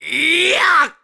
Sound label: Throw Heavy; throwing a heavy object; Link; Skyward Sword; zelda